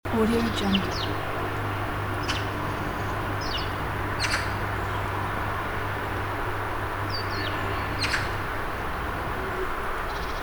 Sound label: autumn birds in distant city ambience